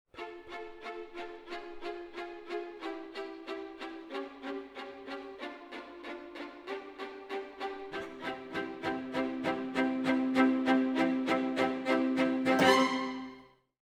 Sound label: Orchestra, Jazz for piano